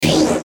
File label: Sweeper Short Digital Buzz; Production Element Imaging Element Accent Transition; Sweeper Sound Effects